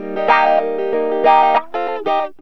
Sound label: GTR, CHANK; Zero-G; Funky Elements